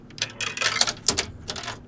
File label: Quarter Slot